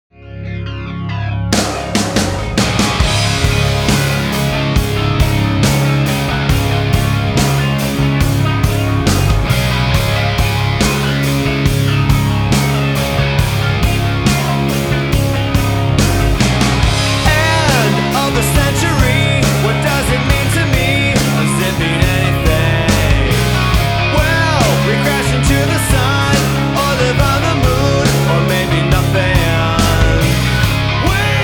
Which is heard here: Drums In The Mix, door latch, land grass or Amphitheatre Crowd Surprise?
Drums In The Mix